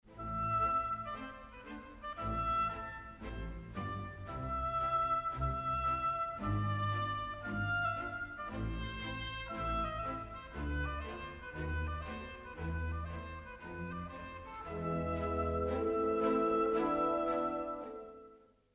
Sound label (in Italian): oboe solo; strumenti